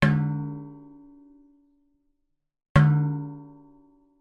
impact metal tray small med object strike resonate x2